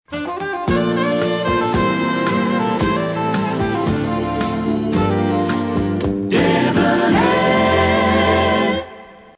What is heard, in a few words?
saxy; idents